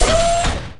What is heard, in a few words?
mech step; drones; garrysmod